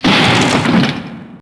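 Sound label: door break; PC Gamer IT CD 38; thief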